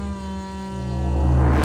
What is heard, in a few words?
Mus Tsunami 145 Light; from dust